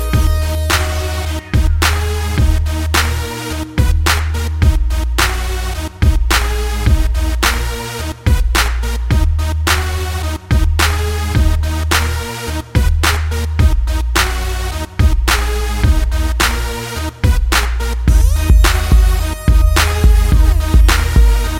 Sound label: HIP HOP REMIX, ringtones